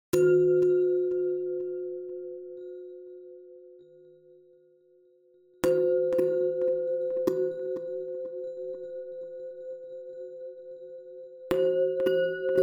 Chromaphone 3

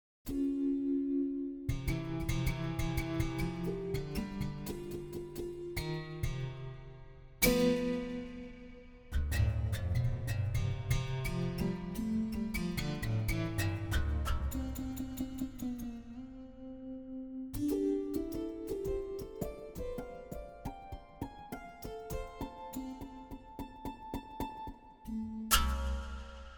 EGP

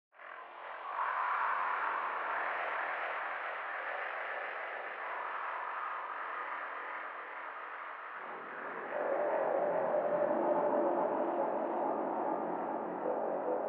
Padshop 2

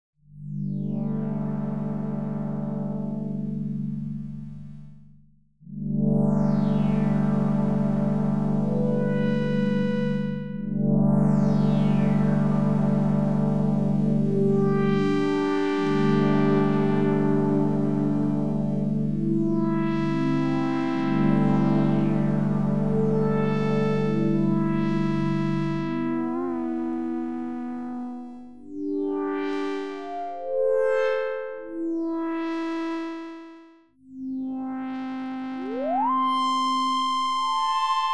Tubes And Wires

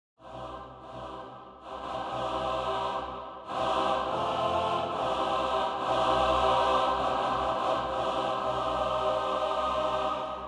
Choir; Olympus Choir Micro

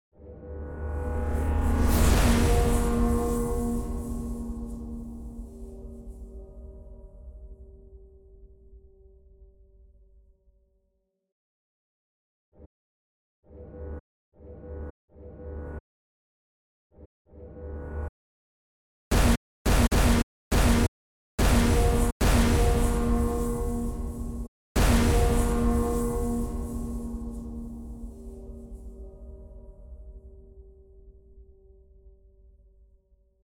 RISE HIT

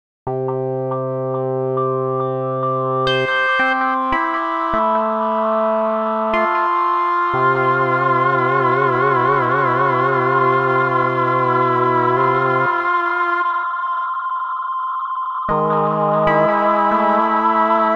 Tempo Delay

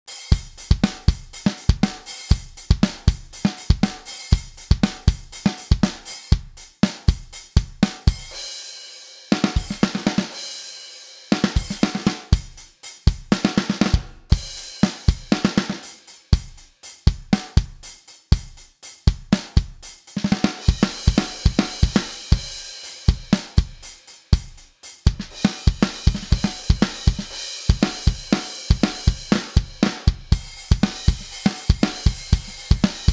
Groove Agent 4

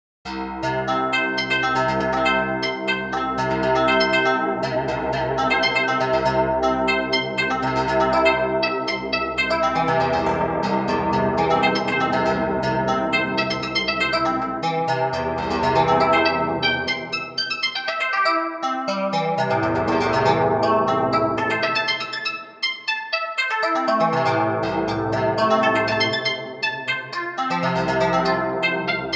String Studio VS3

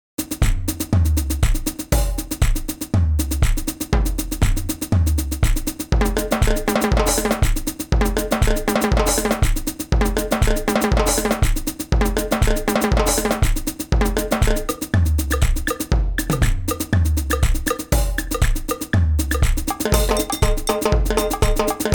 World Percussion

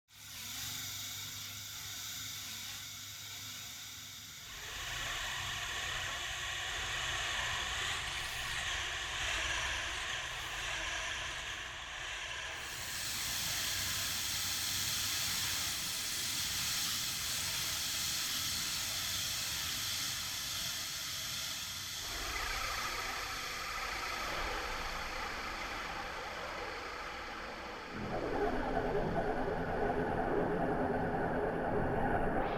Padshop 2

